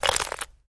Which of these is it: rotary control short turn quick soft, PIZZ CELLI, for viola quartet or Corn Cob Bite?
Corn Cob Bite